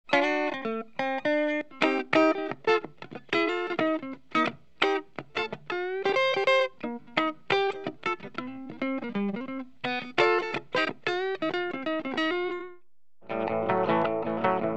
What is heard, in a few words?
clean Crown D-75; Model 7